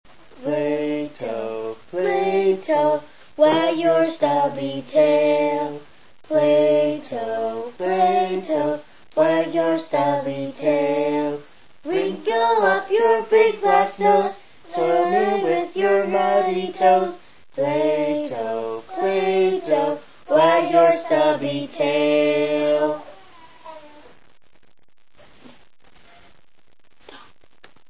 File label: theme song